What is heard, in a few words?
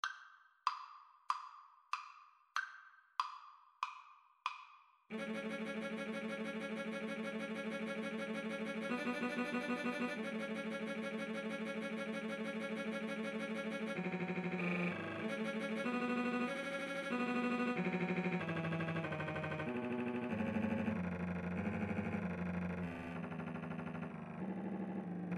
scene finale, Violin Cello Duet